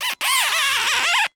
Gloves Rubber Gloves Squeaking On Wet Glass; Foley

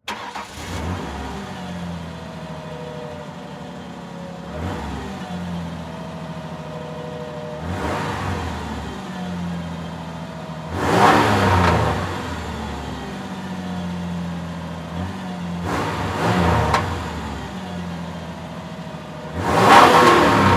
Truck Ford Ranger Start Idle Rev Off Mic In Rear; transportation

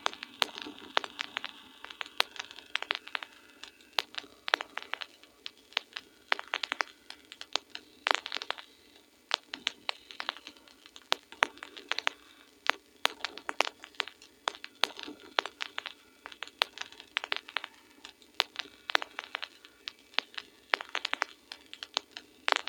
pop loop, Vinyl; Cineblur

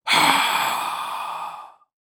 Horror Scary Vampire Hiss Sigh Breathe Hiss

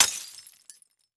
Crash Glass Shattering On Concrete; crashes; AdobeCC

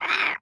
Animal Toad Spadefoot Toad Quack; animals; AdobeCC

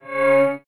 Science Fiction Sci-Fi Electronic Door

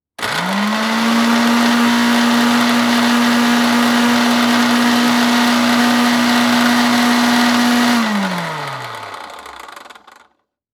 Household Blender Run Low Speed

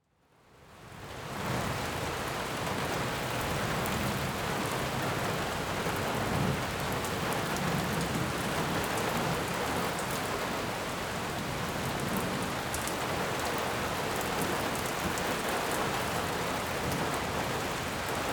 Weather Rain

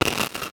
Transition Distortion Electronic; Production Element Title Transition

